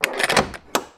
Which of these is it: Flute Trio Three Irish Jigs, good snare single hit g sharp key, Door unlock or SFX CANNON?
Door unlock